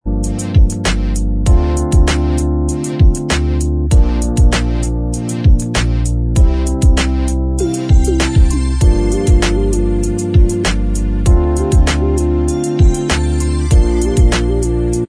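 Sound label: instrumental, backing track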